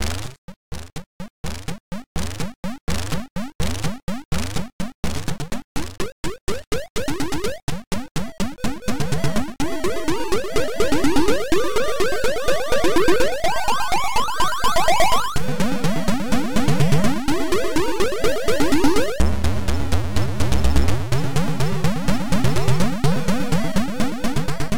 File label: game music; Line